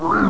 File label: hurt; gusanos promode